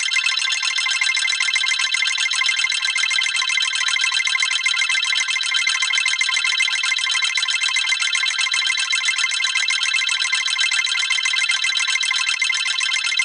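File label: nokia ringing under water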